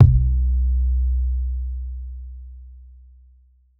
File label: 808's